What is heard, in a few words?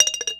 JAR CLINK, rhythmic hits; Rarefaction; Poke in The Ear With A Sharp Stick